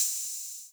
Hats, Closed Hats

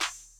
Claps

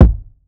Simple Kick; Kicks

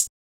Hi-Hat, Closed Hats

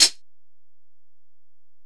Hit, Closed Hats

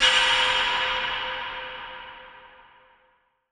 percussion; striking time

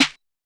Snare

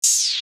OH, Open Hats

